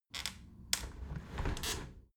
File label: window handle squeak turn close halfway soft; objekte; fenster